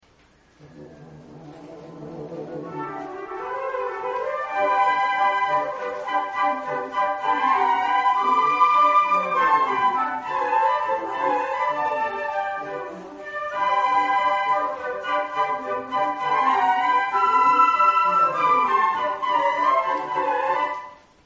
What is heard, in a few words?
concert, flute